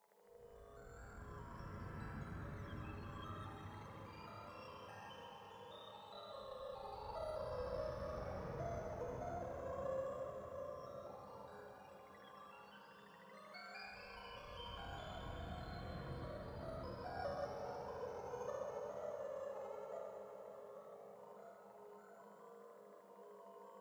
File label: creepy loop